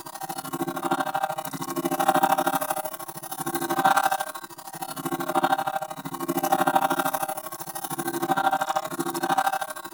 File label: Protea Fan Energy Trench Loop Active; Warframe